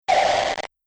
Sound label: Short Laser Interference